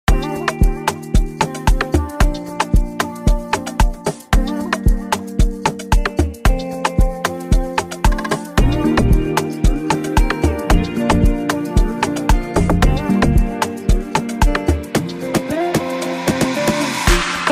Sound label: Amapiano song Christmas